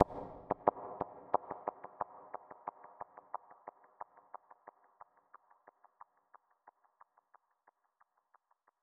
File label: impuls psychedelic complex; reaverb